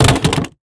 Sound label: pistol drop wood; STALKER; IWP